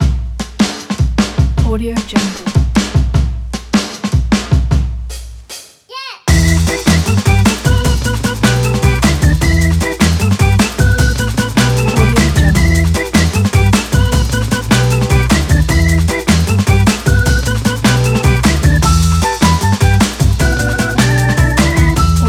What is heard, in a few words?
positive upbeat funky break